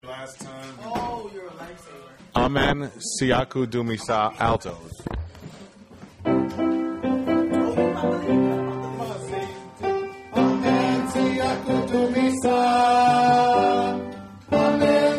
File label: Amen Siakudumisa Alto Part, choir